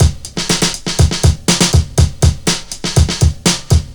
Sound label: Electronic, Drums & Percussion; Sonic Foundry (Sony Creative Software) - Electronic Point Blank